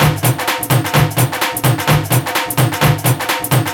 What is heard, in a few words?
Latin House Loop 128 BPM; Vengeance Samples